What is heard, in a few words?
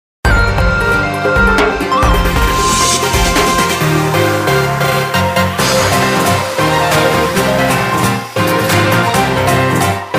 Battle BGM